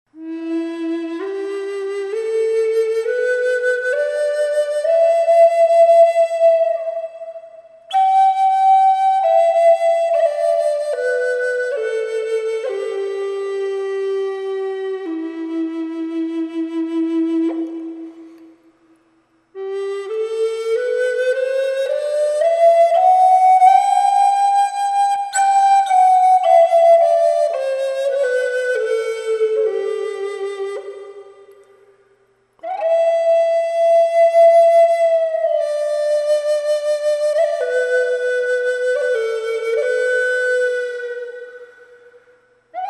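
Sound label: E minor with reverb, western red cedar flutes; standard flutes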